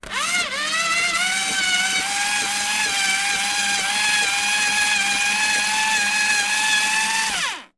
office paper shredder overload struggle

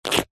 cartoon suck lip smack; zapsplat

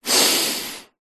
human breath in inhale through nose; zapsplat

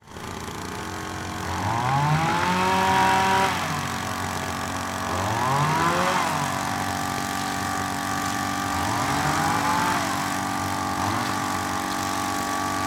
industrial hedge trimmer strimmer operate cutting

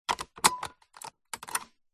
car suv nissan patrol seatbelt click; vehicles

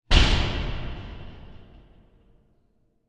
sound design big horror hit metallic cinematic

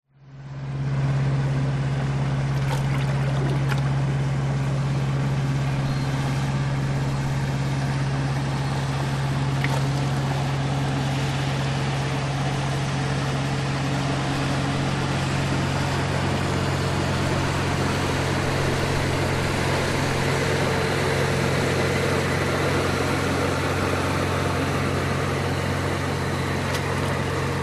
transport boat motor pass by harbour inlet

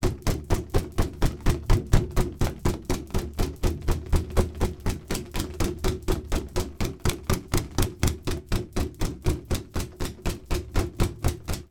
foley balloon punching balloon; sound effects one

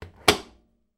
household washing machine door close; sound effects one

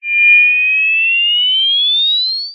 cartoon ascend rise climb whistle; zapsplat

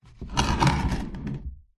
wooden box crate scrape movement on concrete

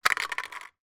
empty drinks can roll or drop on street; foley

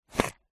cigarette remove slide out of packet

